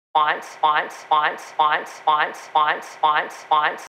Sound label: Vox; Unit Rcrds